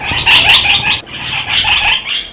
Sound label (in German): morgen flug, Katharinasittiche, ein gemeinschaftliches, lautes Geschrei